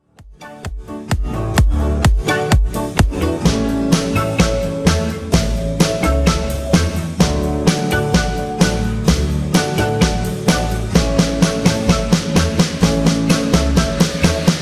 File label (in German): komplett D-dur